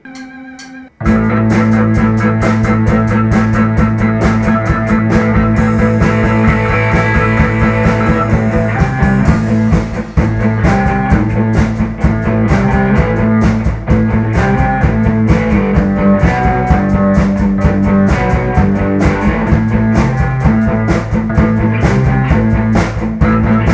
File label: 4 note riff